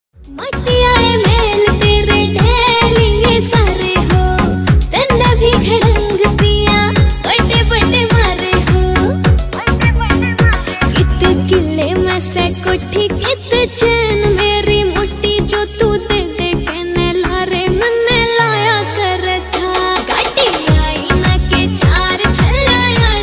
haryanvi ringtone

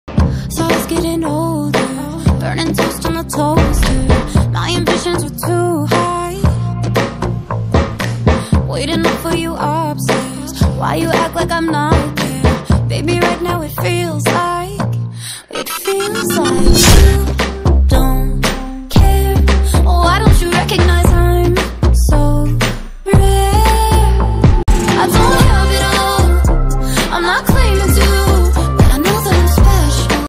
english ringtone

